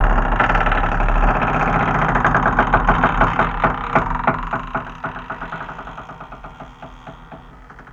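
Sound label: DOOR OPEN; Best Service Advanced Media Trax Building Blocks; MONSTER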